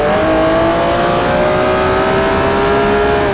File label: third, vehicles; gmod; tdmcars; enzo